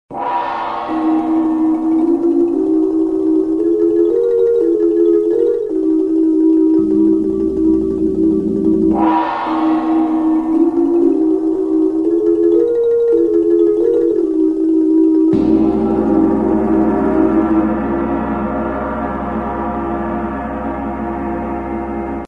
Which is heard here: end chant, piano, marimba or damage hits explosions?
marimba